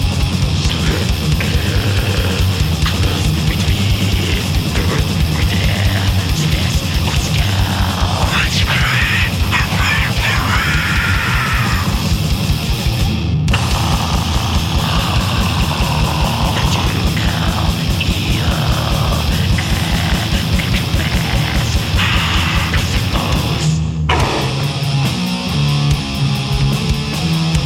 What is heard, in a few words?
Black Metal